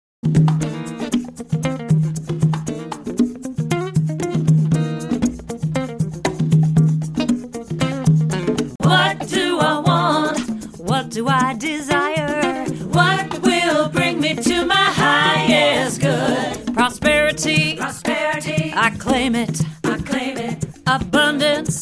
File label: Prosperity Chant, Up Tempo Chant With Drum Circle